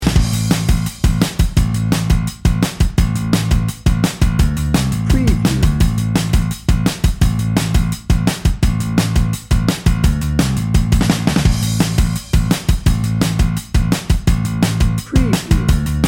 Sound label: xtreme power dnb